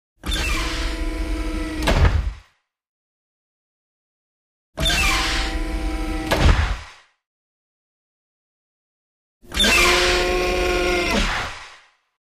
Hydraulic door

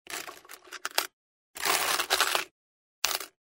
Removing coins from the cash register, money

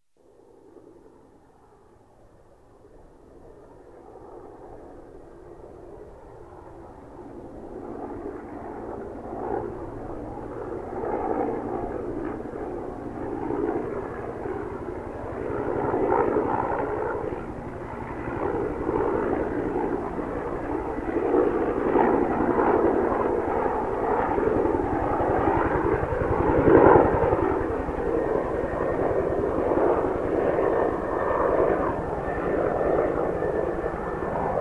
Helicopter flies in the distance

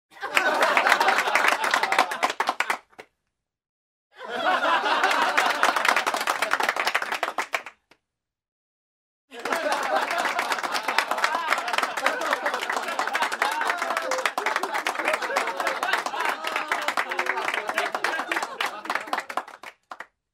Laughter and applause in a small room; standing ovation

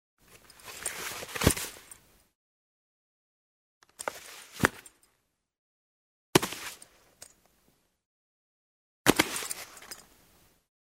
Motorcycle helmet put on and take off

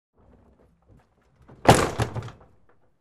wooden door closes

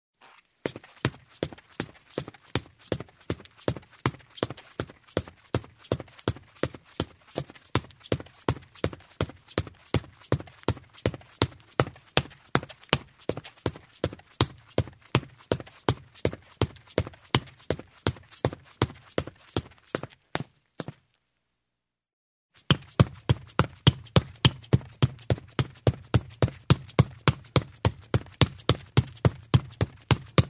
Running on a wooden floor in shoes; steps

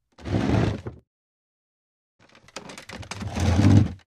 Dragging a wooden object across the floor

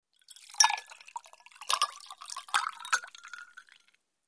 liquid in a glass with ice; water

